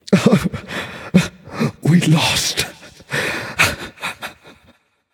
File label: boohoo; smite; kukulkan